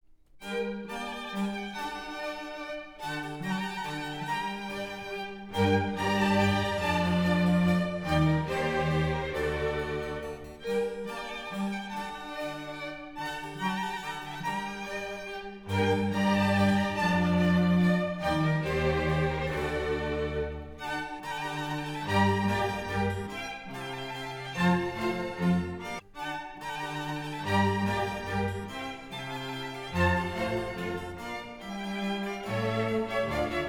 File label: Symphony Orchestra, Concerto Grosso in G Minor; Garcia Navarro